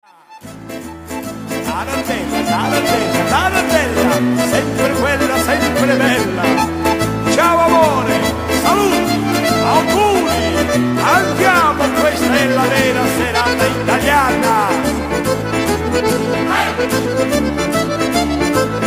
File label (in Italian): Tarantella Neapoletana